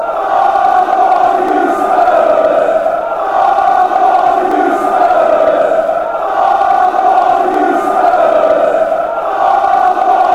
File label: f off you spurs, fanchants